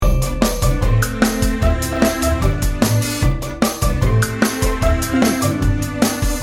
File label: 50s game show loop